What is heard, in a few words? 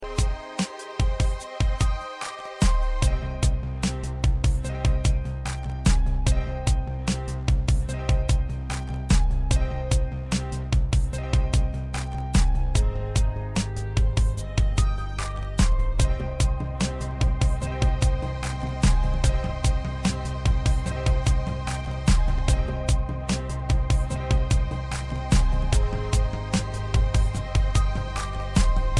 Beat Love